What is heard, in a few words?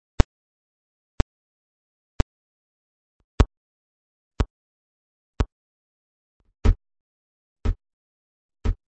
Pulse Rev In Out; raumakustik